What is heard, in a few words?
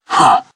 light pain; zero